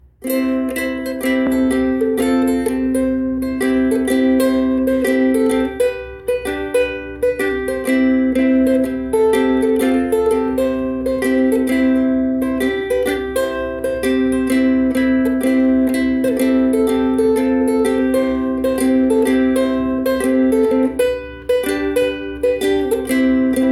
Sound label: ukulele